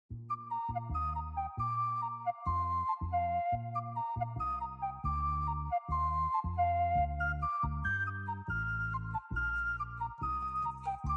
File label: Urban Jingle; Music-only samples